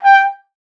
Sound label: G5, TRUMPET; Best Service ProSamples vol.42 - Session Instruments; PS-42 Session Instruments